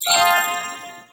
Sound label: card Match; SpongeBob SolitairePants